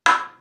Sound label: wooden